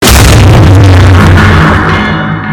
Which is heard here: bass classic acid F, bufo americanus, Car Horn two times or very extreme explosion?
very extreme explosion